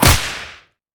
imp whip

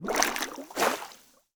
splash water

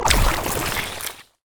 seaborn dead

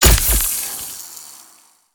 atk rope launcher

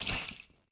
atk heal water

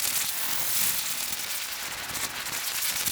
level up start

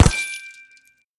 harp bow

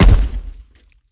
fire sword

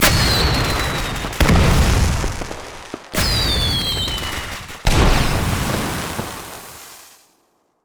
fireworks

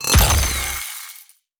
sharpsaw

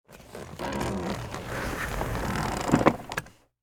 sit down slow sofa

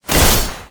sword

